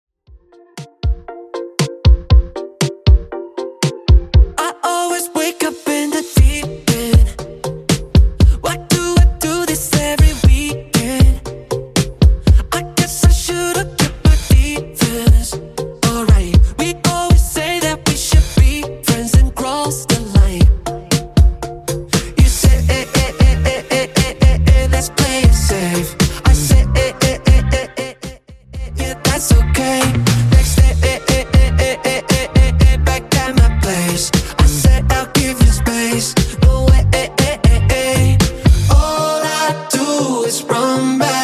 Top40 DJ Friendly Redrum 118bpm

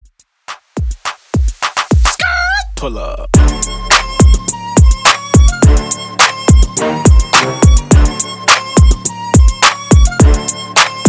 HipHop 105 Bpm; Mixshow

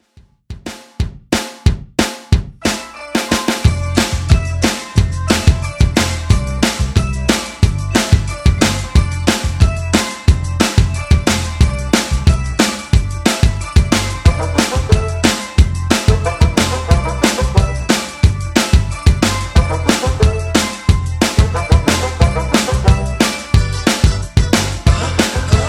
80s Real Drum 181bpm

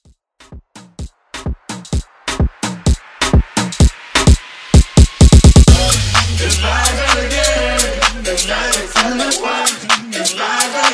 Afro Hype

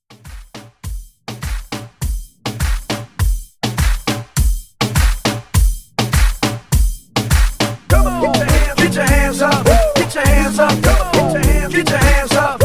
Moombahton Hype; Redrum

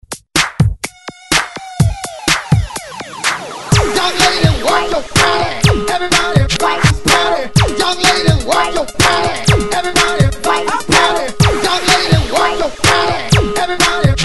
20s Hype ReDrum 125 Bpm

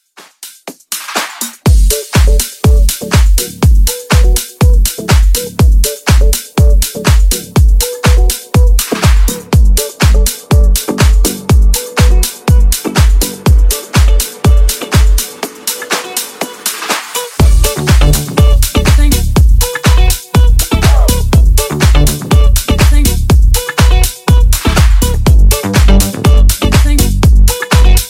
Funk 122 Bpm

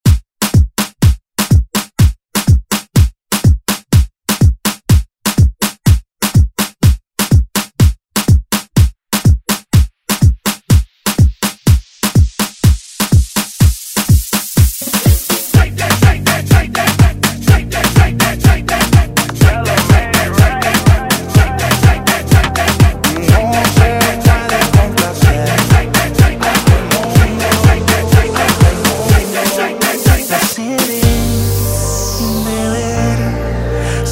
Latin House Redrum

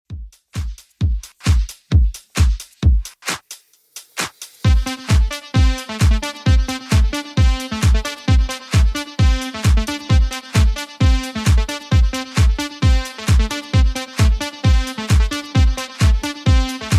80s Redrum 132bpm

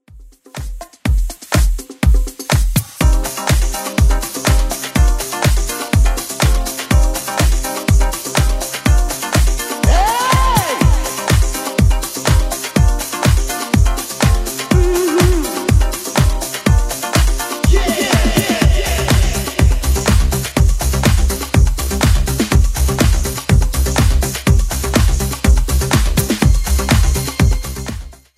80s Club 123 bpm

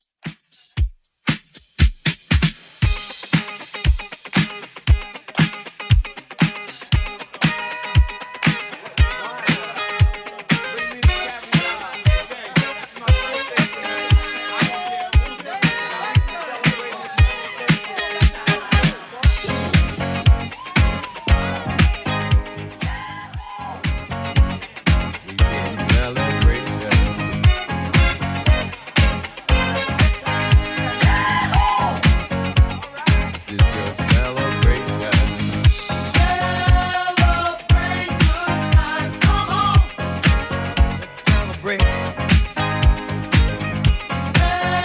80s Redrum 117bpm

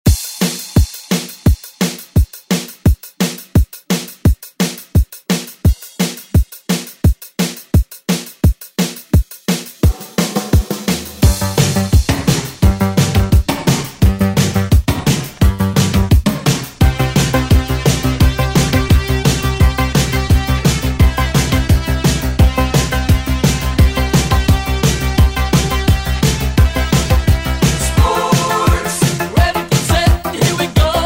80s ReDrum; DJ Mhark

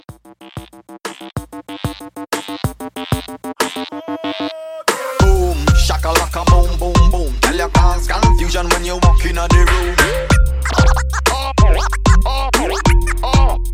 Dancehall Hype Scratch

